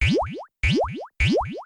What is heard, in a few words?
Splash; infinitefusion